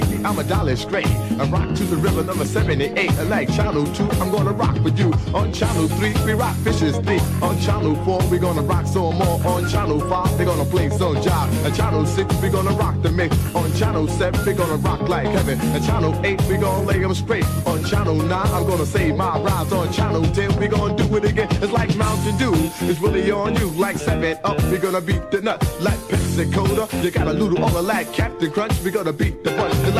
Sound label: boombox